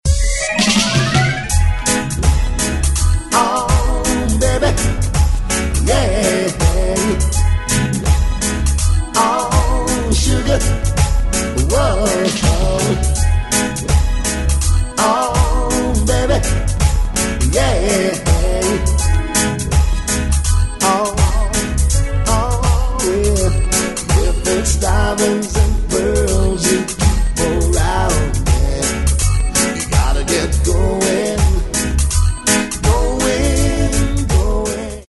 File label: SAX